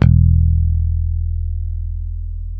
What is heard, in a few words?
HIPH E 2, Bass, HIP-HOP; Spectrasonics; Bass Legends CD 1